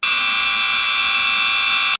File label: Alarm Clock Ringing